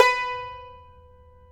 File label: tar; Roland - LA Composer vol. 3